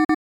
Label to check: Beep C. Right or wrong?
Right